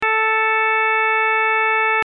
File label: frenchtrumpet, hammond drawbar; French Trumpet 8′